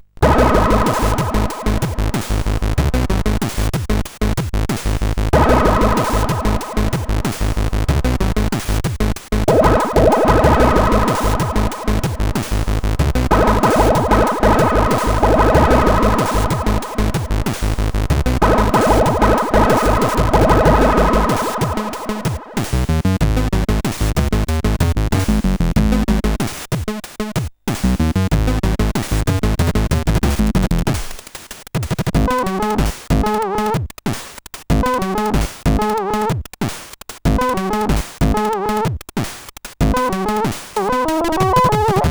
Little Beat of SID sid CSG8580; hvsc

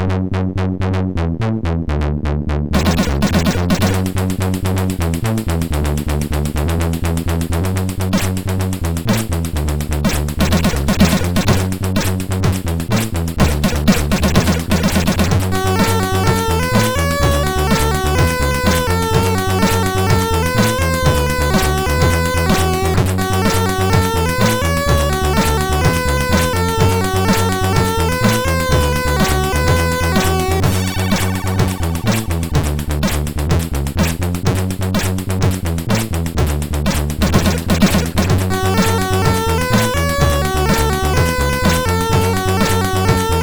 tune, MOS6581R4

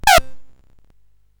CSG8580R5, GAMES; Spikes Peak

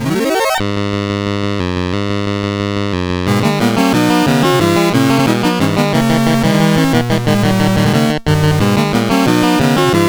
Battle Medley, sid CSG8580R5; Final Fantasy

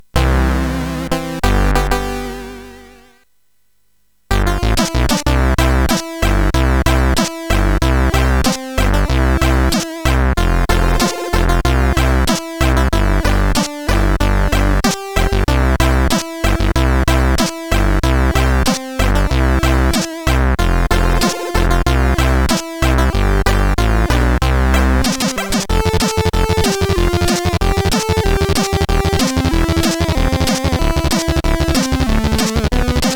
Jingle Bells, sid CSG8580R5

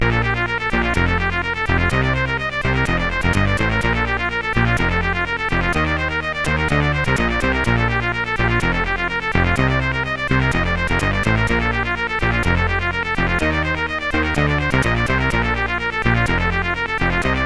Clik Clak, CSG8580R5

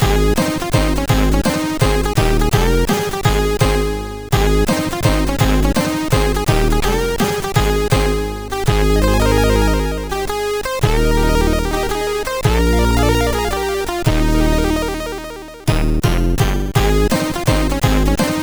Xmas Cards, sid CSG8580R5

